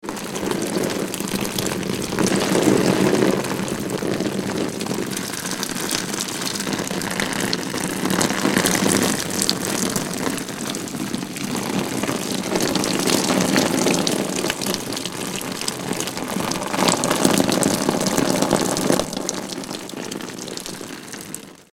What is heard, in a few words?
Rain On Helmet; raindrops, splatty